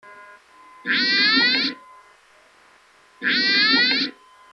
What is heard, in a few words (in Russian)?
korotkij zvuk kasatki